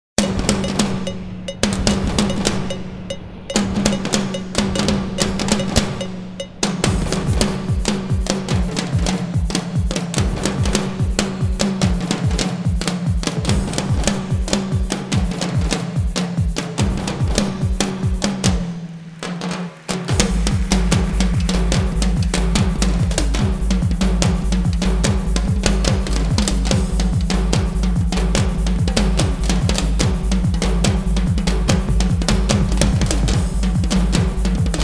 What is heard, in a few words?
percus. solo